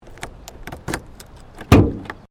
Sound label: Car Ford Focus Bonnet Open; Ford Focus hire car; Cars; Vehicles